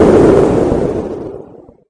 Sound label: explode; VIDEO GAMES